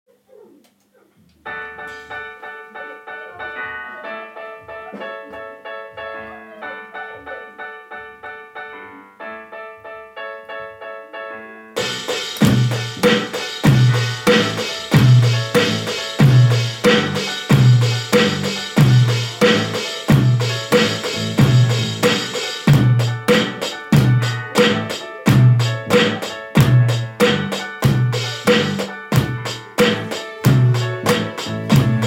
drums

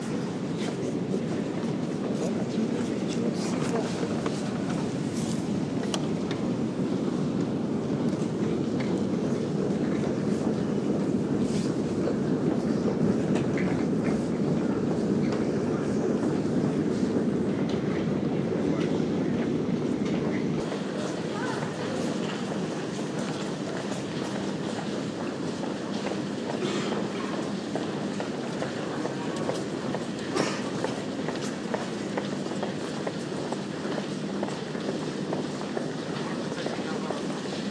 subway sounds moscow

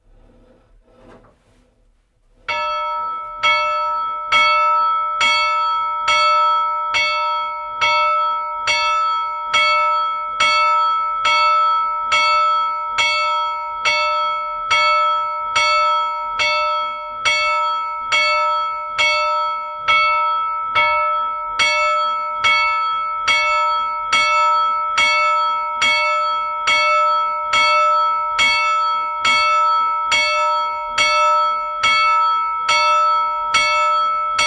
church bells ringing from inside; Moreton Jeffries

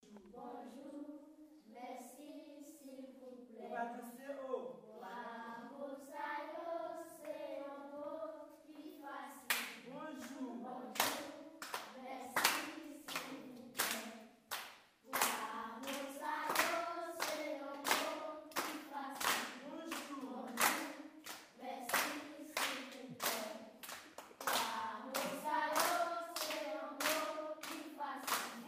the kids singing at the school in tienne

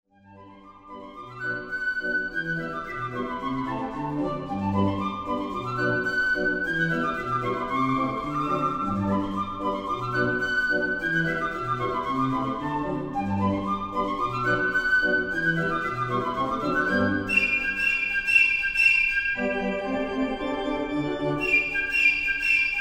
spring dance for piccolo and organ; hans andre stamm

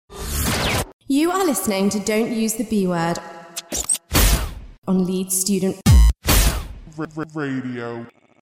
jingle

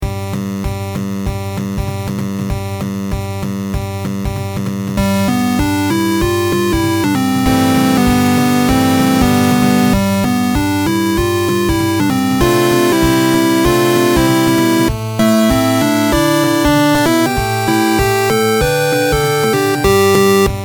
chiptune

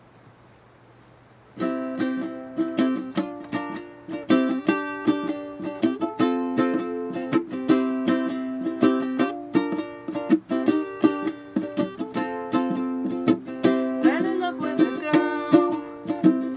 ukulele

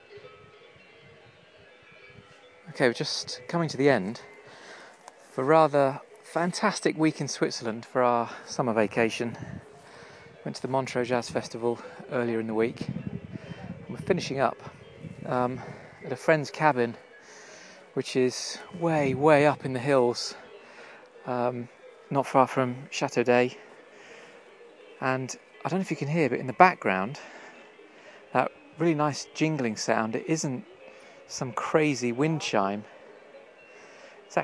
summer time jingle bells

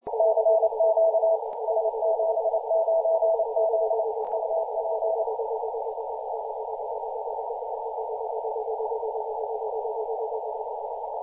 3 501mhz cw cqing

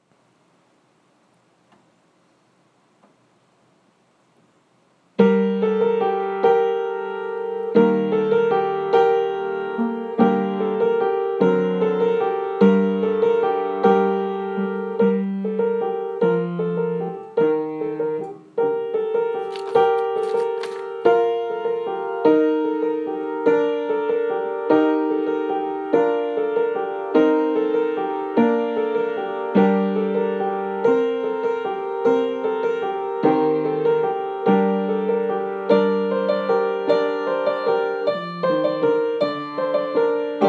carol of the bells accomp

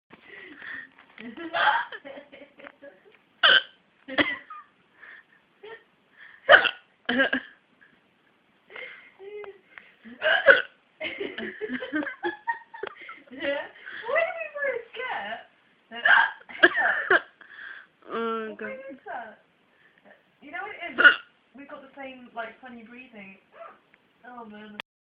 hiccups hiccups hiccups

